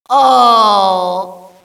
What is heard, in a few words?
ohhhhhh, Echo inside caves, underground; DS; ML BOWSERS INSIDE; Luigi